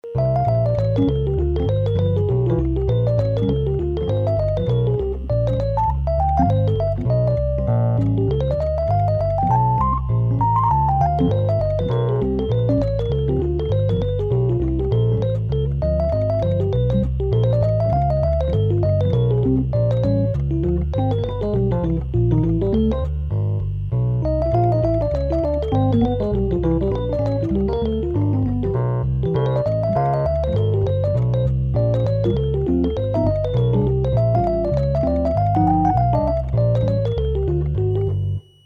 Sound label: etude C, jazz piano